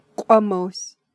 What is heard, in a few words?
PLUCK